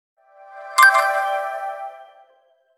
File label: Email alert; Nokia N900